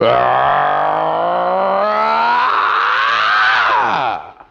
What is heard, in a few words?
growl, Voice; ooh ahh